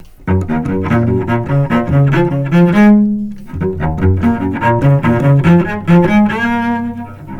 Cello, 451

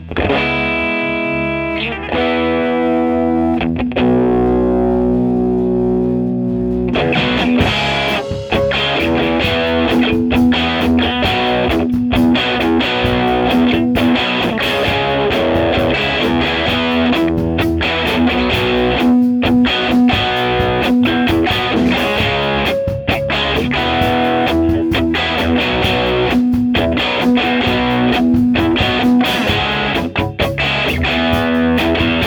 ele GTR, sample; Electric GTR through modified Fender Pro Junior; FatHead

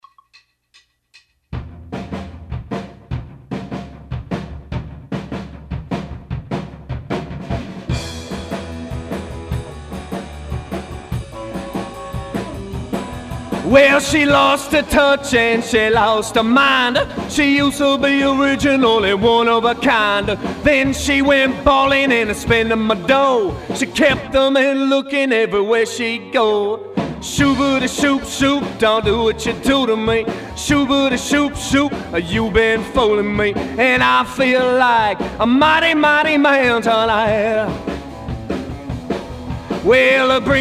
Vox w Slap Bounce, isolated Vocal Mic track; note how little leakage

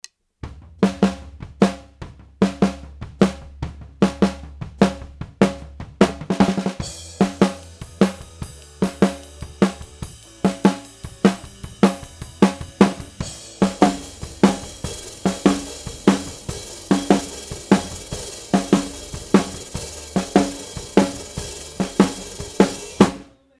snare, Sony C-37A on snare

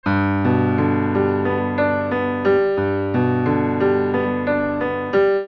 piano, blumlein; Ribbon

